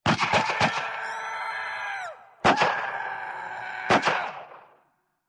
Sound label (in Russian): v otdalenii kriki i strelba